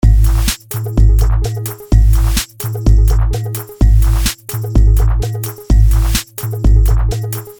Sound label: Bass; Allen Tutorial